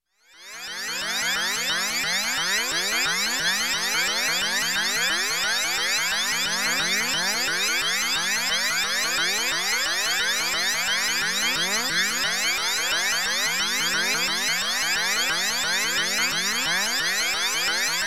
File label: Space Warble Tone, Medium, Electronic; Sound Ideas 2000 Series